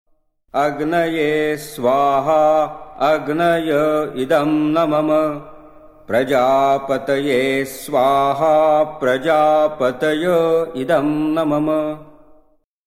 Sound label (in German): agnihotra mantra sonnenuntergang